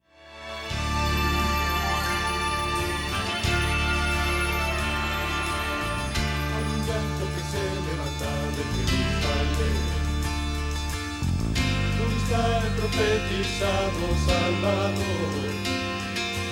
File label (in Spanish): Bajo